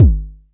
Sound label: BD; TRX